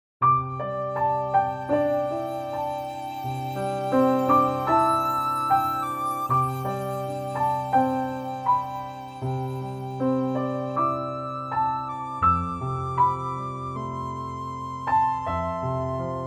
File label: Chat Room; Hello Kitty Online; Beijing